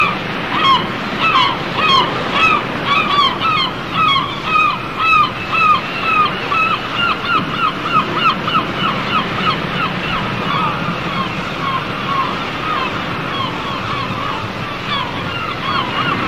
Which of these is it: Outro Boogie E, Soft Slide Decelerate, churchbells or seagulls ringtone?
seagulls ringtone